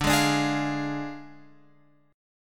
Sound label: D Diminished D